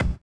collision; Spectrasonics; Metamorphosis

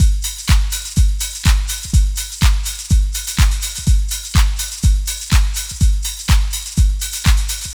LOOP, Tekno Industrial; East West

